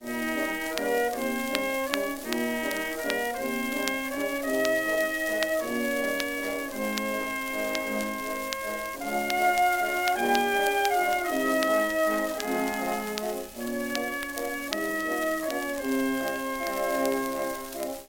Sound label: Merry widow waltz Burlesque